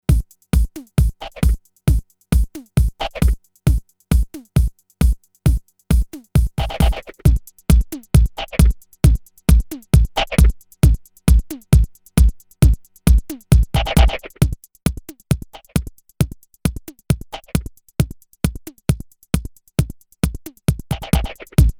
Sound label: Peavey Spectrum Analog Filter Drumloop kompakt